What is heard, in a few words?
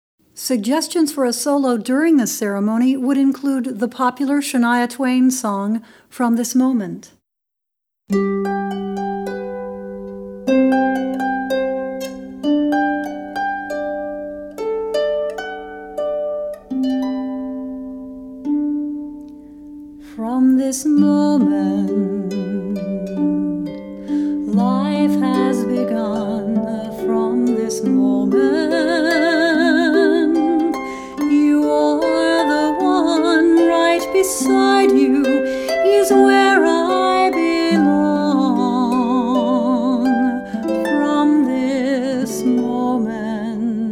harp